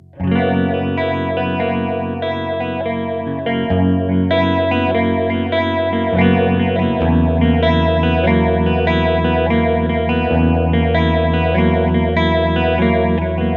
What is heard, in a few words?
phaser; line6 spidervalve; sortie pod; effets